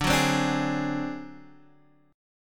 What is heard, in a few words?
D 7th Flat 5th D down Guitar Standard; D7b5 strummed; JGuitar